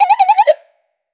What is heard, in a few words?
retro cartoon gunshot; animation